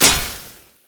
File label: basicattack; LoL; varus